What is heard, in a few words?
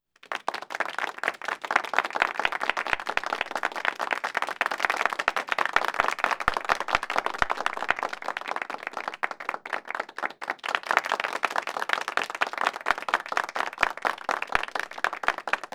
Crowd Applause; Vocals & Wallas